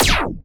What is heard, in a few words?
science fiction laser